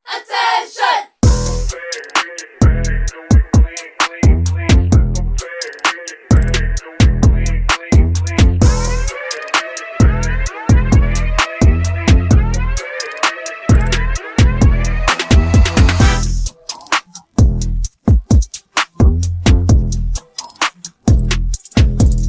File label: instrumental